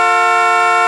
Clackson, motori

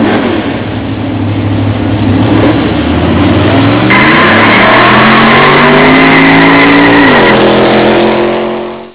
partenza sgommata, motori

